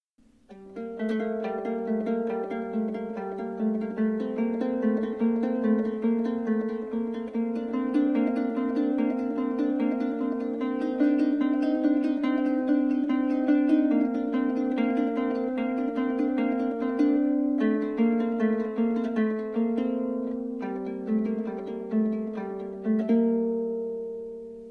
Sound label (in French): cordes pincees tierces; Contes celtiques